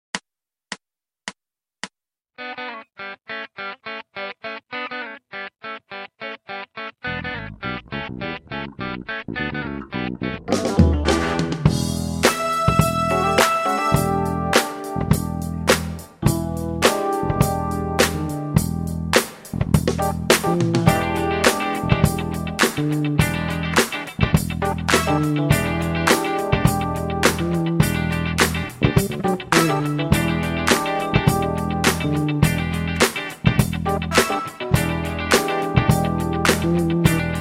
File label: rnb